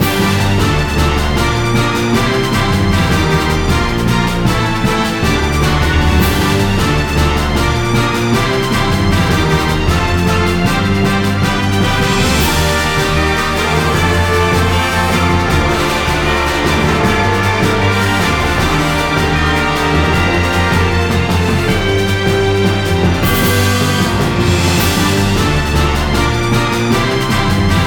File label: battle; tukito; srpg